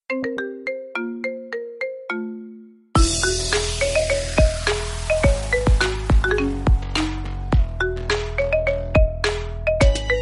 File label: Remix Ringtone